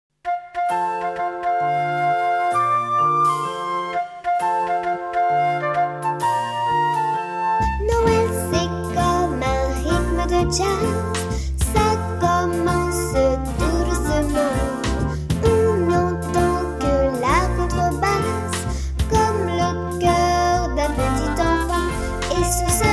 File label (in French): Noel jazz